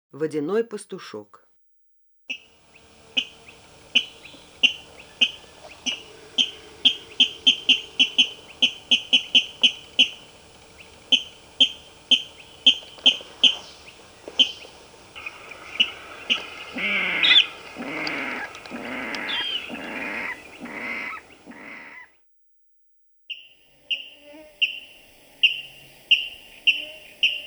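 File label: pastushok golos, water rail voice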